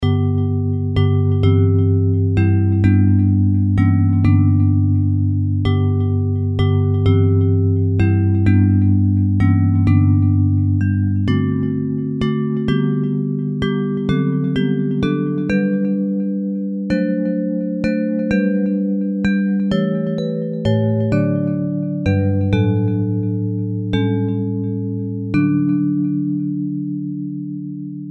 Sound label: Bells